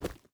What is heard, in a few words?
footstep rubber boots forest run; Rust